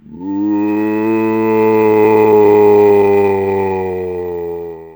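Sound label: zombie battle, creature; GammaAge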